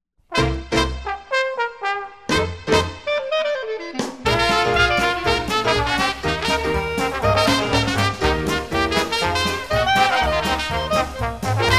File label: dixieland band